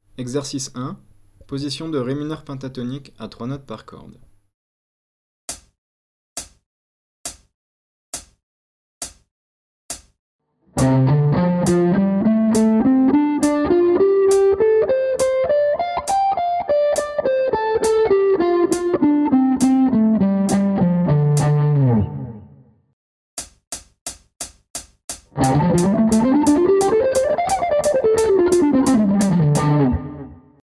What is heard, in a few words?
penta 3 notes